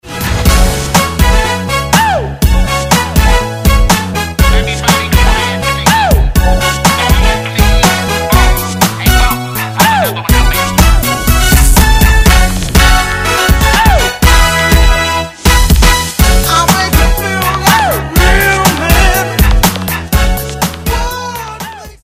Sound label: beautiful 122bpm; sample